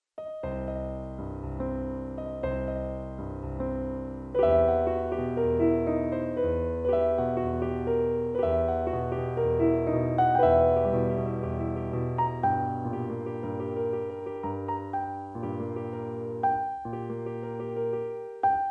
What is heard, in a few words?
Piano